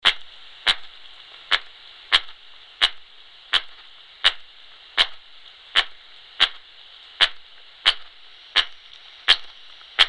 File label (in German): Balzrufe